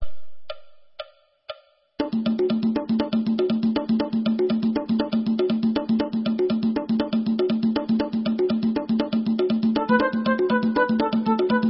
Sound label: Debka, Instrumental